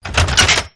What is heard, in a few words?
elephant gun reload lever load; gow; fortifications